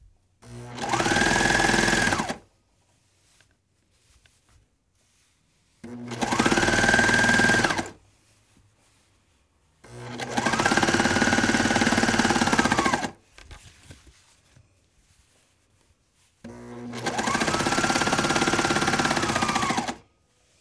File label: Serger Sewing Machine